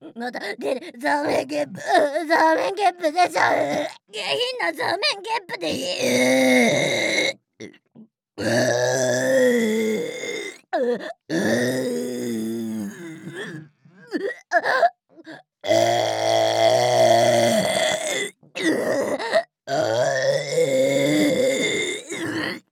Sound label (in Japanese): ザーメンゲップ5連撃; ザーメンゲップ集; ASMR